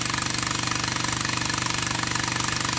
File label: air comp